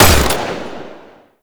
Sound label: Burst Rifle Fire; Grineer; Weapons